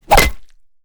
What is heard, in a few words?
gore weap golf club gore; soundmorph